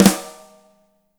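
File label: DRY snare, NATURAL KIT; Spectrasonics; Burning Grooves CD2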